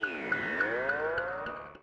Wheel medium Sound start

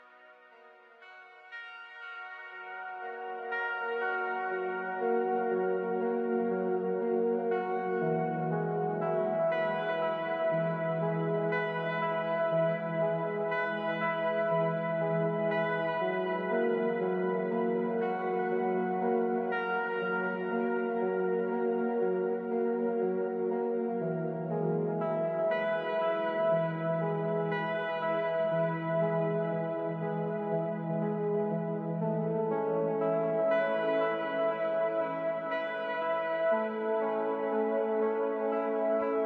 Space 2 horn

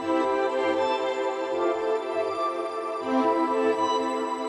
Holy Sound Loop; Deputy Dangle

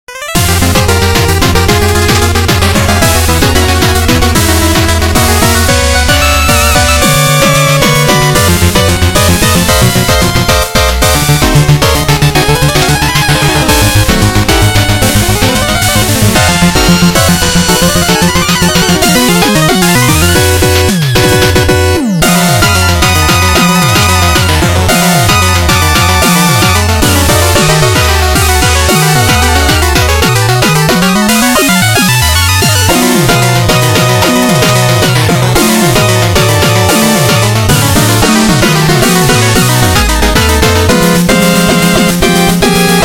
Music Shield Battle

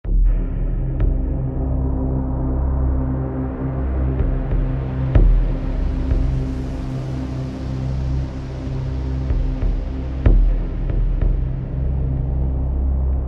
Stab

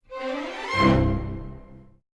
Collect Sting; PS2; PSP

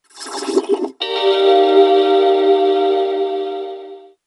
Gate SFX; Splatsville

